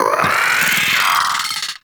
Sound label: Amalgam Moa Vocal Attack